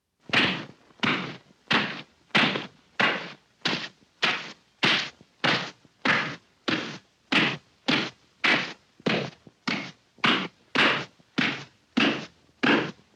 Silly Cartoon Face Punches Boxing

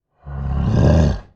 Growl

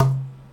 Cardboard Tube

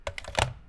Phone Hang Up

